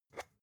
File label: spice jar scratch tonal open fast